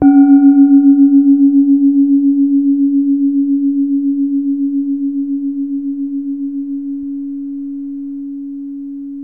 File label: calung, gamelan; gravityharps